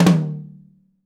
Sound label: mid tom, drum; 90s sample CD